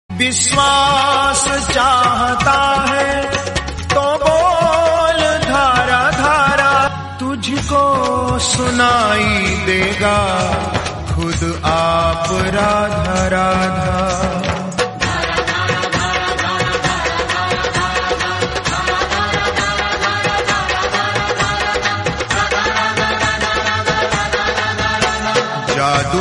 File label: radha radha radha song bhajan